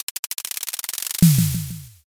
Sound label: drumroll